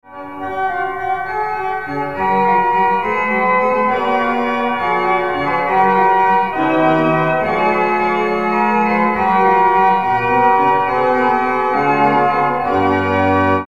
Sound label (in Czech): varhany